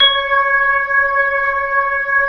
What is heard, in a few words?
B-3